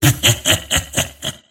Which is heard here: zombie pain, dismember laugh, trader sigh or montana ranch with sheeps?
dismember laugh